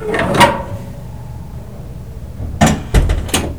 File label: Door Close; inner demons